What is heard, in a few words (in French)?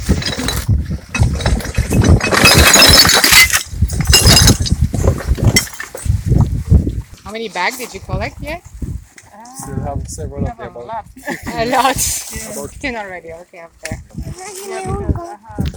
plage